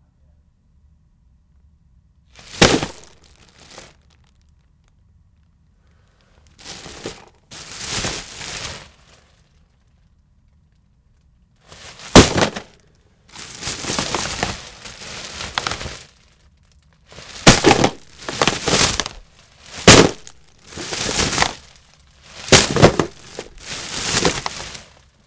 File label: Dropping Trash Bag With Dvds Inside